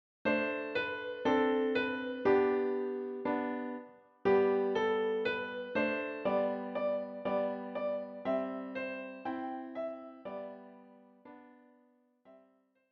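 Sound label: piano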